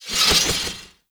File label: Spear Gun Whizzby; Warframe; Tenno; Hoplite